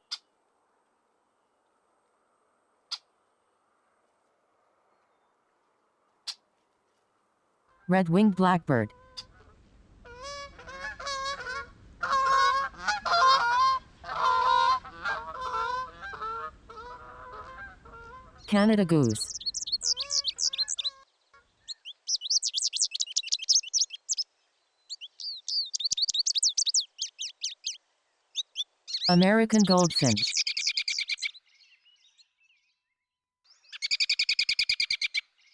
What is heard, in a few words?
Common Dupage Birdsong; Chirp USA App